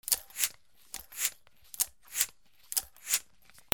GARAGE

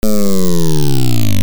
ALARMS

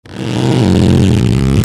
TOYS

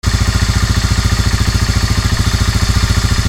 VEHICLES

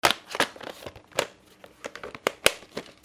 HOUSE

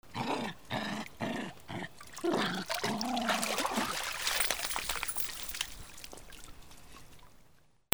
DOGS

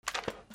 PAPER

